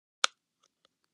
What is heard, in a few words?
Light Switches Click